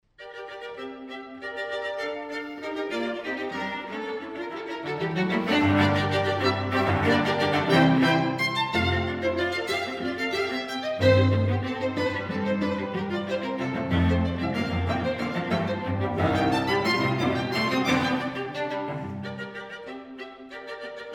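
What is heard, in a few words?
string trio; rontgen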